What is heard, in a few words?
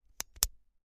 ink pen click; ink pens